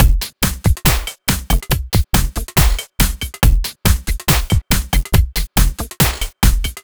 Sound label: Slomo Beat 140 BPM, Loops, Slow Motion Beats